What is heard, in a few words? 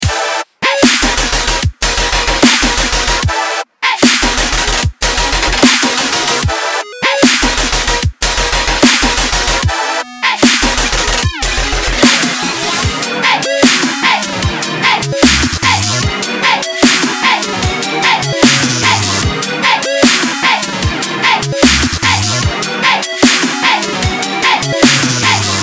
COLORBASS